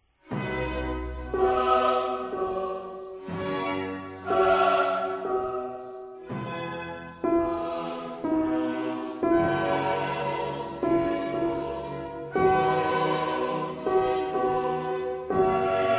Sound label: Sanctus Alt; spatzenmesse